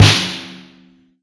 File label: snare; Tank